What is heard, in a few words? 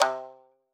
marimba shamisen